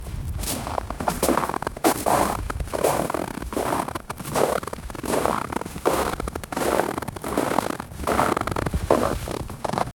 three people walking on, sled

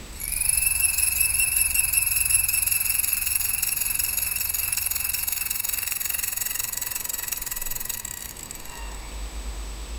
fast spinning fidget spin; toy

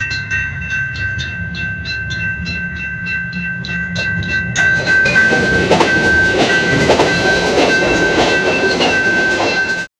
indian train sound effect

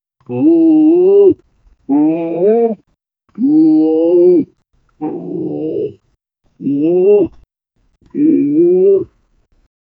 zebra animals voice, animal vocalization

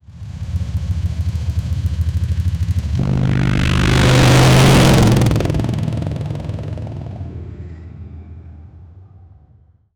motorbike kickstart sound; motorcycle

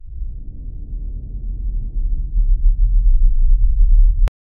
sad sound; tearful

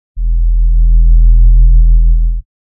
sound based on fm; abstract

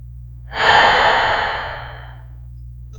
the sudden startling soun, creepy sounds

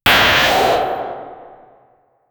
a short sound of victory, arena

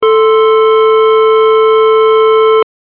count down timer sound; round end

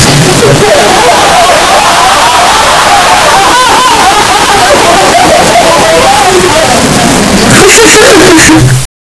crowded theatre laughing

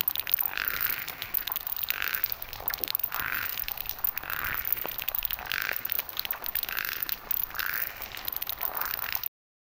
wet squelch frog croaks

